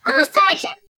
rifle

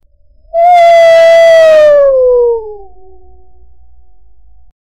an eerie sad melancholy, wolf

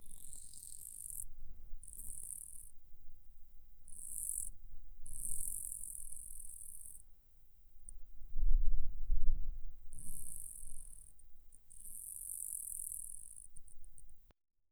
warble wet warble animal; natural

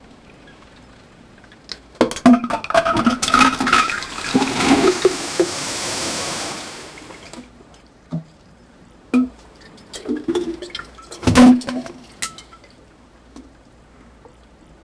trash plastic bottles, dumping

